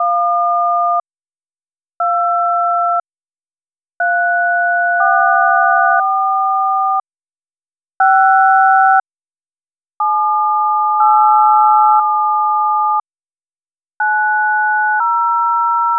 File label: stereo dtmf tones